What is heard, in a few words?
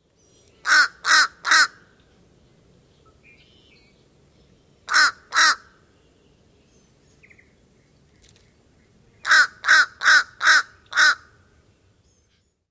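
Fish Crow caw-caw-caw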